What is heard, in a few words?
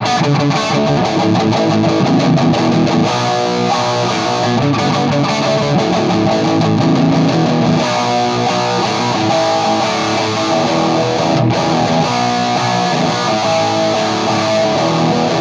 Cranked Rock N Roll; Bax Bangeetar